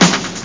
tom snare; The Epic Collection 3; Epic Marketing; muzak samples

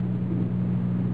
Electric; U-Boat 1.5.3

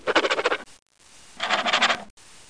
Scribble; Bible Fun Pack; Education Publishing Concepts; NOAH

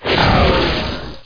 Magic Missile Spread Fire; Weapons; Heretic II

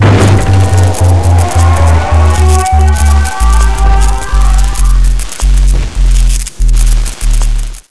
moan

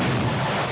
explo

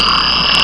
Frog sound; HyprFrog Demo

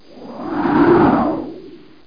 Swish

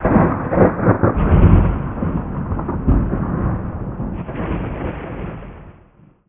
Thunder Quiet Distant

